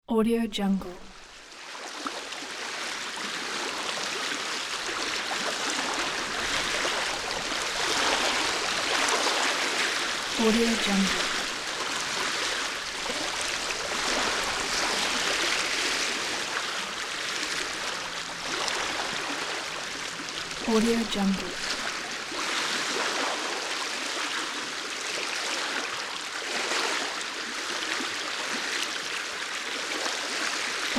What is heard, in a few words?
open sea